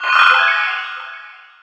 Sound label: Magic ICE MELT